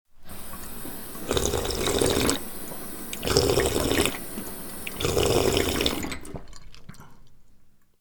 Drinking From Water Fountain Water Sound Effects

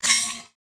Futuristic Weapons Sound Effects

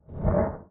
creature monster

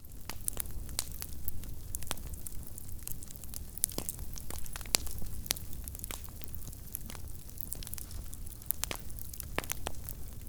BONFIRE SMALL Fire sound effects

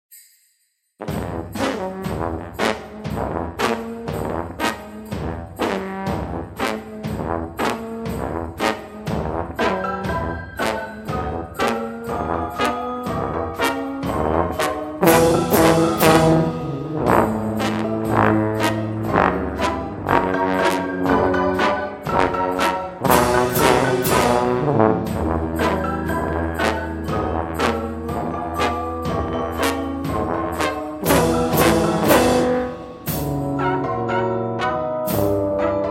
Dance of the tuba plum fairy

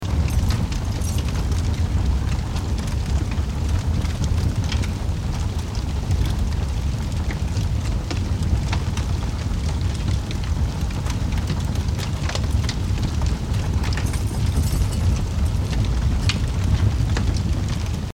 fire auto car on fire, Fire sound effects

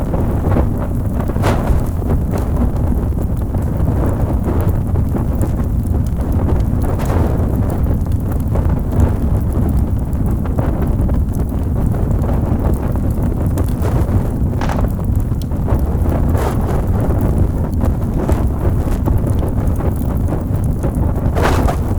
BURNING DOORWAY, Fire sound effects

